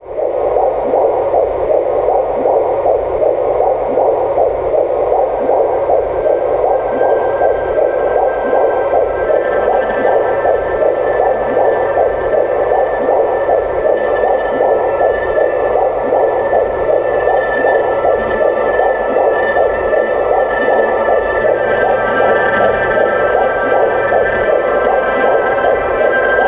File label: fetal heartbeat signal through the noise